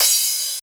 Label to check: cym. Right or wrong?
Right